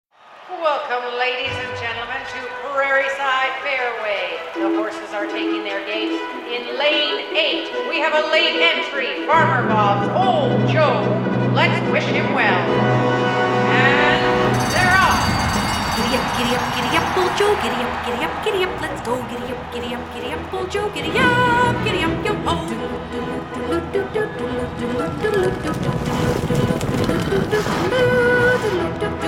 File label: vocal; HorseRace